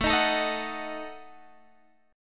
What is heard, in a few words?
D sharp Diminished A